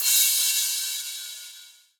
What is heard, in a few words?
Crash; Equipped Music; Loungin House